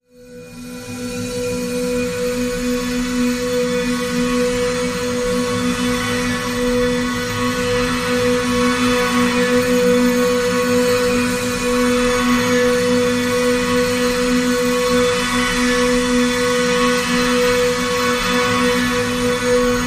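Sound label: Drn B, drone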